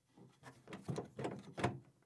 Car Old Handle Locked Jiggle; Fairlight Sound Lib

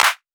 Clap; Drum One Shots

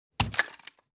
Gun Drop Movement or Pick; Fairlight Sound Lib

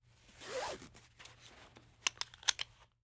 Belt Buckle; Fairlight Sound Lib

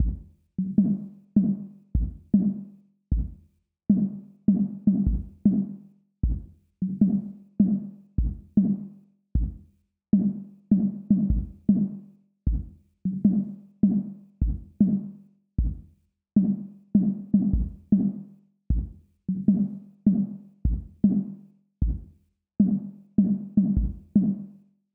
77 BPM A# Min Percussion, RnB; Melody; Stems; Cymatics; 2022 Melody Collection (BETA); City Lights

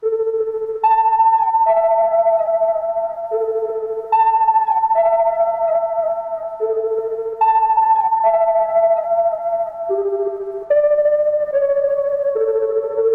Dark Melody Loop - 146 BPM D Min Lead; Melodies; Loop Stems; Cymatics; Oracle Sample Pack

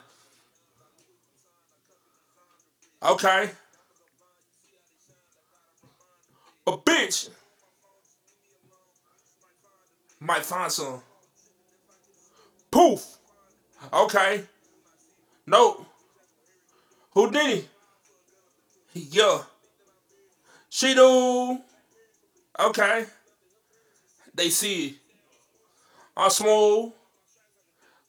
Acapella 132 BPM D Min Ad Libs (Wet); Vocal Loops; Cymatics; GHOST (Beta Pack); Put It On

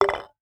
Crafting UI Tab Button

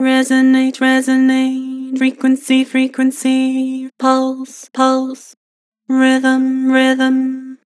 Flat Rhythm pulse frequency, Harmor Vocals

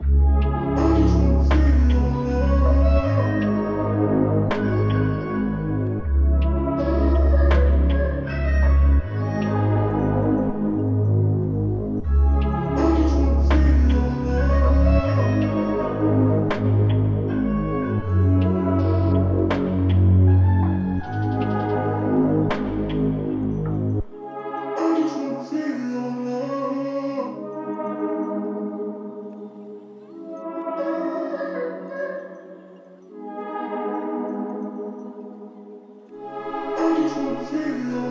160 BPM C# Min, RnB; Feel Ur Love

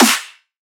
Riddim Snare Clap

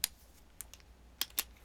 Pen Clicks; Fairlight Sound Lib

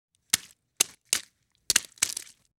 Crow Vulture Pecking Flesh; Fairlight Sound Lib

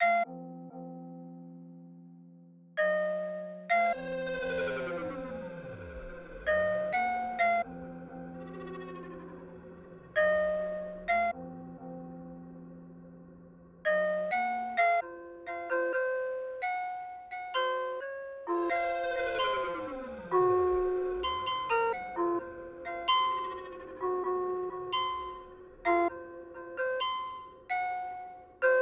130 BPM A# Min Accents; Trap; Melodies; Loop Stems; Cymatics; Trap Starter Pack; Different

